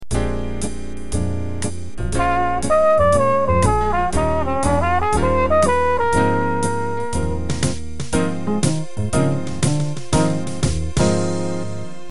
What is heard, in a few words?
lick